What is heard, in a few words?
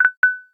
message